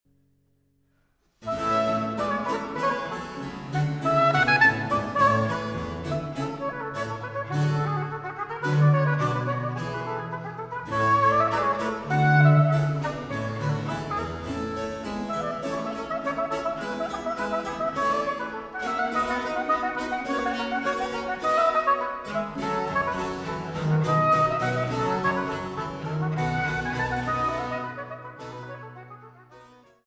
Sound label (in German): Solo 5, B-Dur für Oboe & B. c.